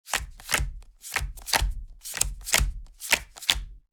Board game dealing cards; leisure

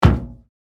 Footsteps on metal ship deck single step

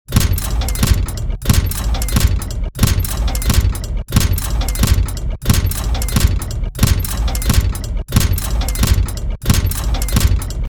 Heavy industrial press machinery loop

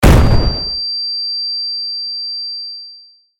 Flashbang explosion silent ring

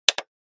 CD player button press play

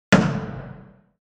Piano surface hit with hand

